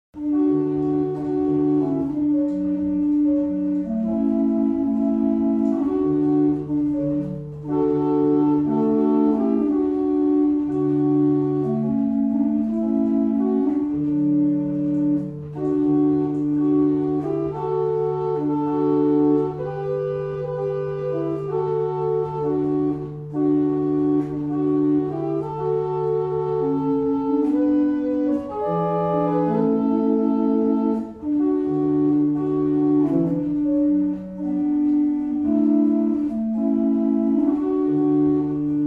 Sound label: barrel organs; Cardeston